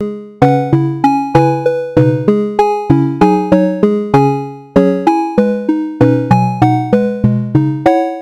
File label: game music